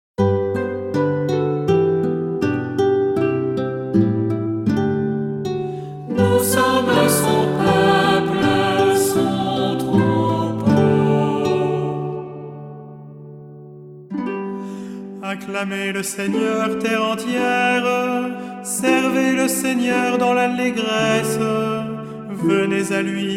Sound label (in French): Psaume, Nous sommes son peuple, son troupeau; Ps. 099; 4 dim. Pâques C